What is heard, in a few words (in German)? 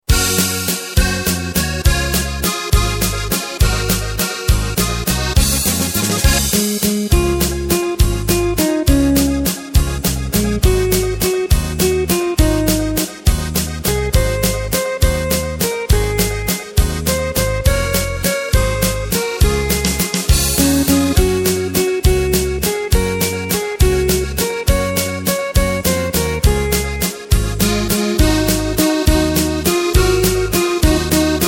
Mariazeller Lied Drums